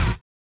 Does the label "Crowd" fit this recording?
No